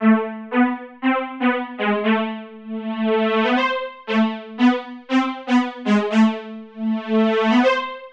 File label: key lead 118 bpm; loops; sc essentials